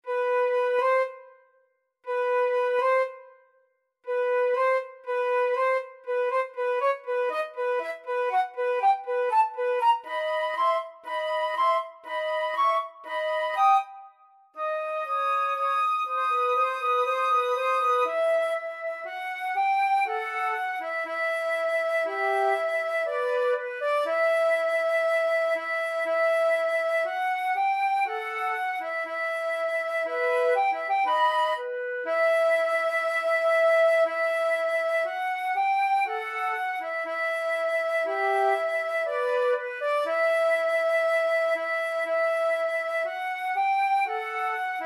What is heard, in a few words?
flute duet